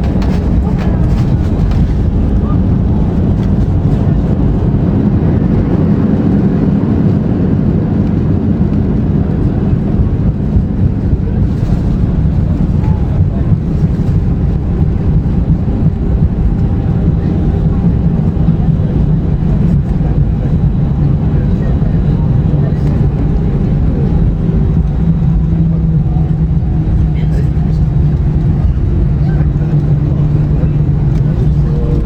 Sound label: rumble, 737-800